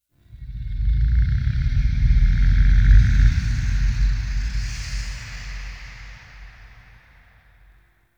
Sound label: Inhale Large, Ambience; Warframe; Sentient Lair